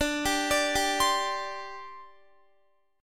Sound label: D 7th Suspended 4th, down, Guitar; D7sus4; arpeggiated